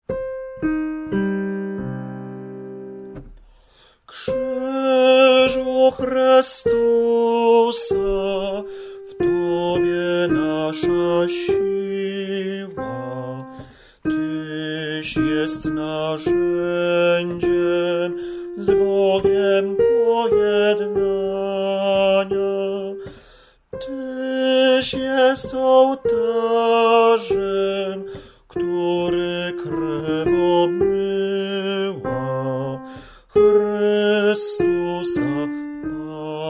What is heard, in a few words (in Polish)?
Krzyzu Chrystusa SOPRAN